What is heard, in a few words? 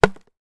impact wood stick hit log hard; sound effects one